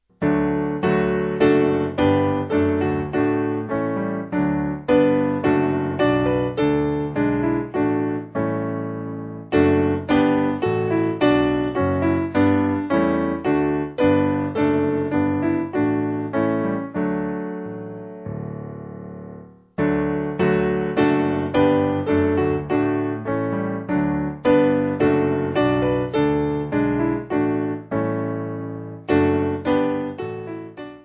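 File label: Piano, Music Backing Files for Churches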